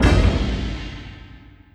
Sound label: symbol hit scatter